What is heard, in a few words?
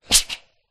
household iron steam release punchy